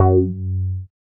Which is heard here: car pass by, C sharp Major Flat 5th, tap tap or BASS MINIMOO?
BASS MINIMOO